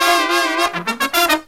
Killer Brass Riffs, 108 soul

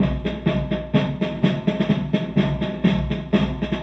Loop, rhythmate, classic machines

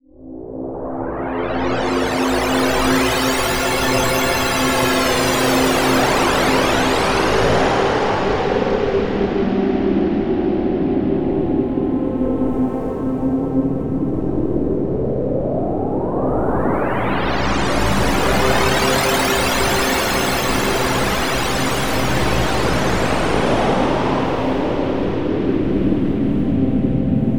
texture cauldron, One Shot Textures; Transmission-X

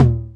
DR. LOOP, DRUMS; X-Static Goldmine 3

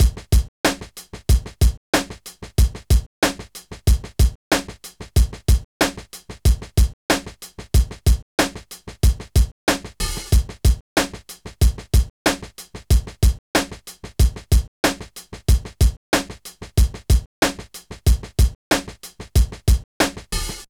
Drum Loops, Minimal; Zero-G Smoke

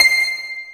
WATER HARP, JV-1080; Syntec; Wall of Sounds VOL-2; ORCHESTR-EXP

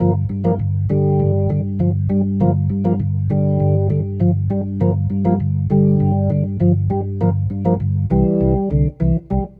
Rotor Riff, Organ

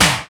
snare, Snares; Roland L-CD701; Snares 5; Sn Modules 5

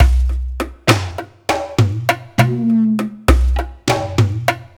Slow and Deep 100, Percussion Loops

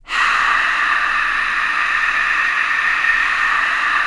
CROWD NOISE; ILIO; Vocal Planet; Jazz & FX

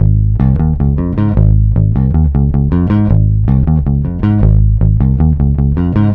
Flutes & Swingers Bass G#; Zero-G Kocktail Kollection; Construction Kitz (Lounge); Flutes & Swingers 156

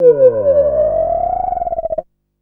FX, Drum & Bass

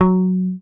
HOFNER MUTE, Bass

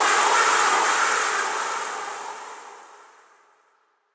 FX, retro house

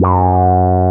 QUAC, QUACK SOLO, Synth

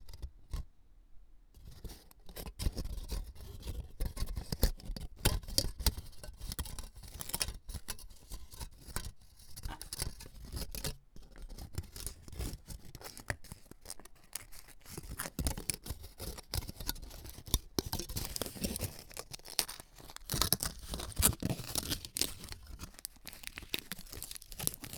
Aluminum Foil

